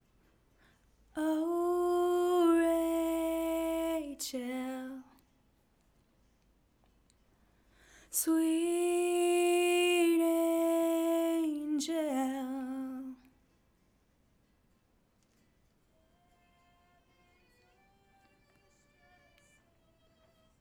Backing Vox

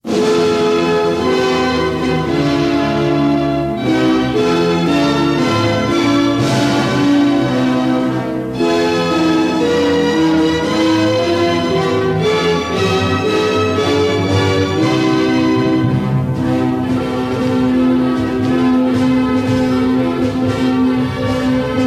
O Canada (Instrumental)

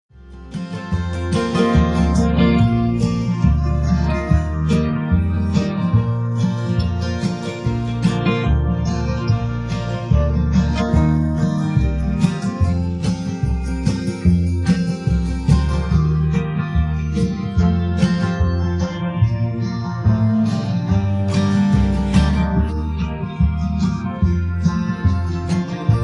accomp

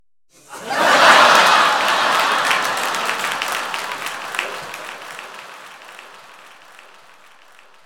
laughing effects

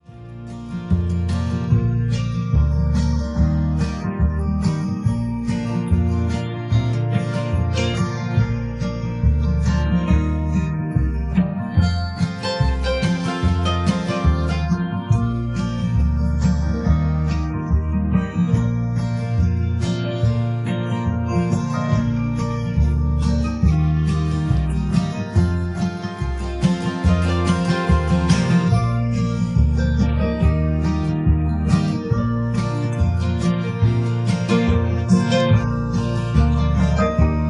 accom

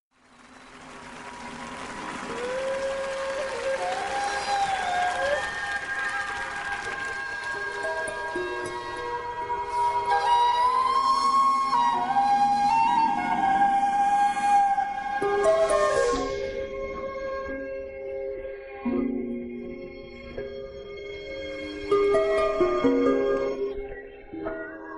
accomp

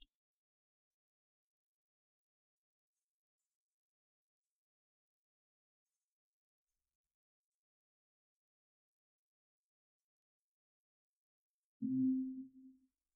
vocals

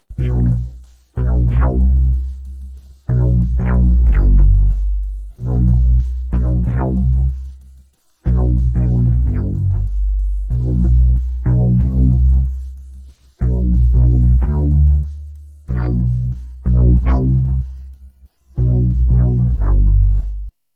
bass, rap

